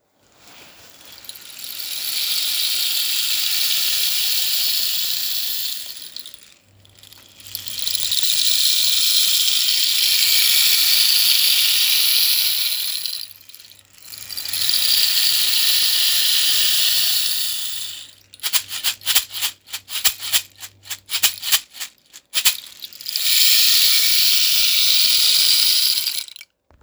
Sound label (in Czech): hul silna, destna kaktusova hul 75 cm